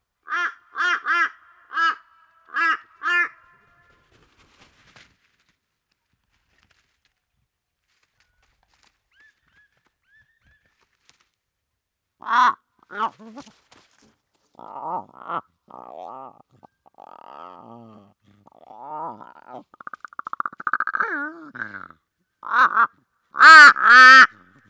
Curious Torresian Crow

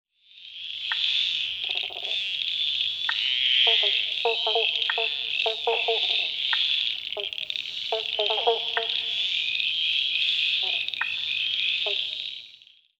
Central Victorian Frog Chorus

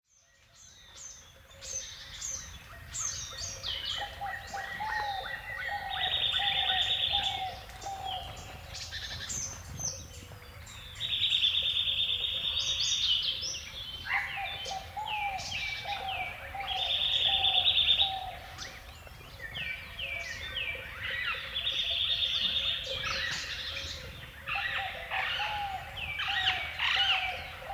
Indian Woodland Birdsong

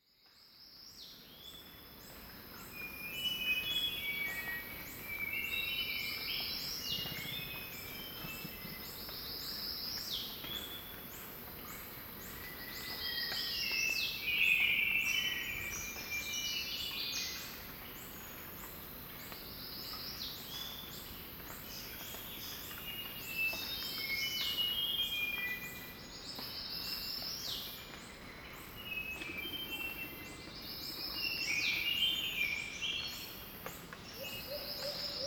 Melodious Forest

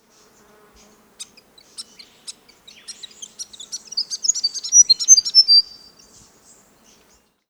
Grey Fantail (Real Speed)

